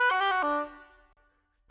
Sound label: Oboe, Orchestral; Sonic Foundry (Sony Creative Software); Orchestral VOL-2; Modern 13